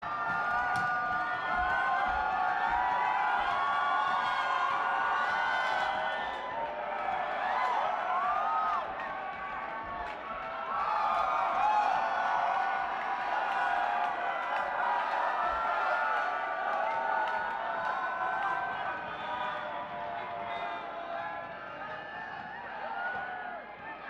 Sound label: Banter Crowd